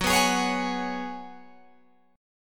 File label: F sharp Suspended 2nd Suspended 4th, Guitar, Open G; chordshape; down